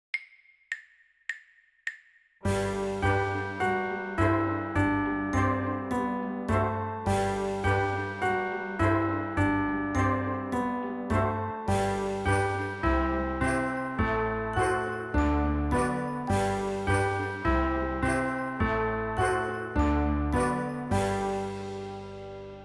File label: THE BELLS RING OUT Practice, piano